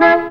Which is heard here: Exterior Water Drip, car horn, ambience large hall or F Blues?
car horn